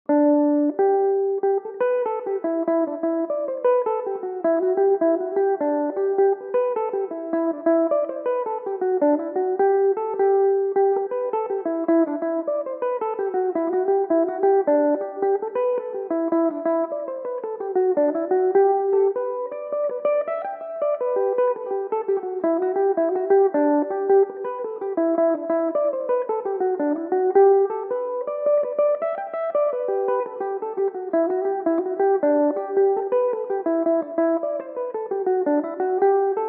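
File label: G major, mandolin; mountain ash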